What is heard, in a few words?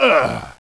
die; Caesar III